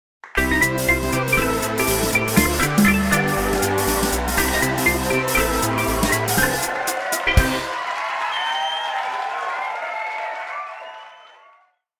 Level Complete; Paper Monsters

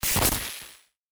Glitch

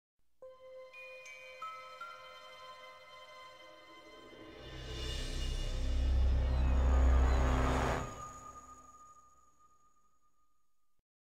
Dramatic; Family Guy Back to the Multiverse

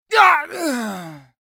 Male Fight Death; Mighty Party; Android

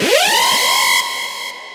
Robot Alarm

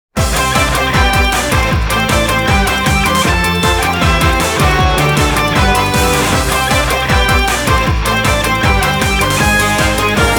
Crepe Shop

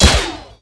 Crit

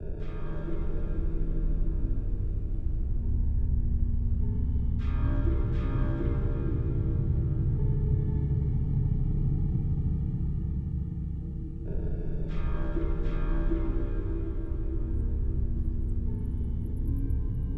Darkness World ambience; Lode Runner The Legend Returns

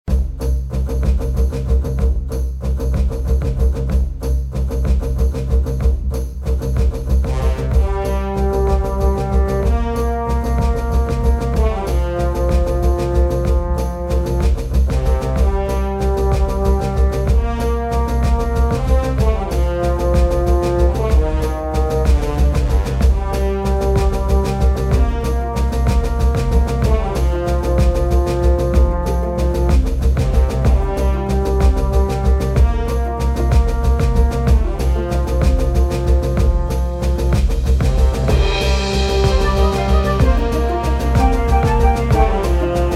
menu music; All Guns On Deck

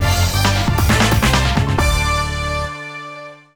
sting; Buzz! The Mega Quiz; PS2

